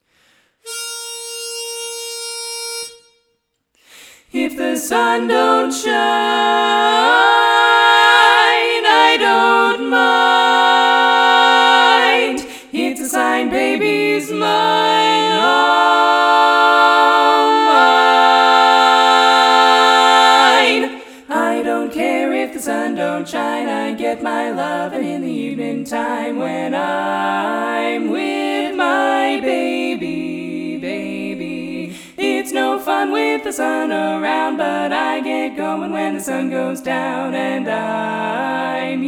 tenor omit, chorus

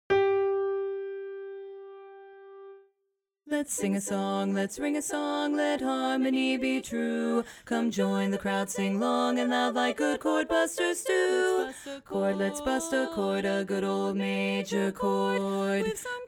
bass dominant, chorus

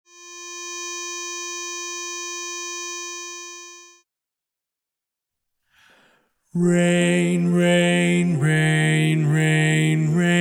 bass predominant, chorus

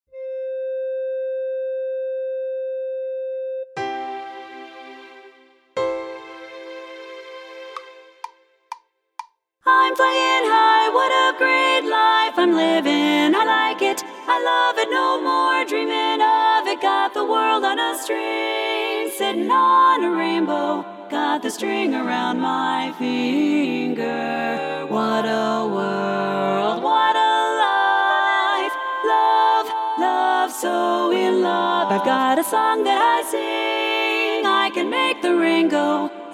lead

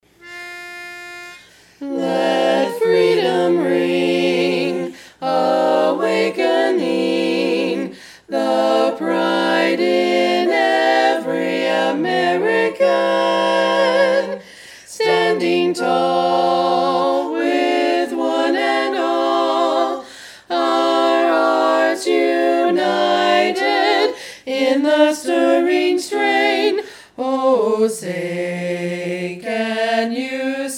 freedom full

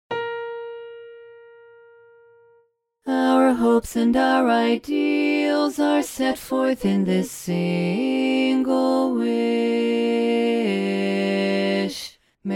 learning track bass dominant

